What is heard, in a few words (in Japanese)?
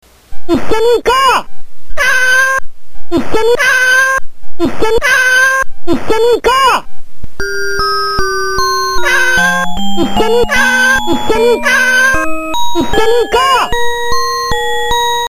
orgel